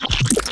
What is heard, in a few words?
summon; allods2d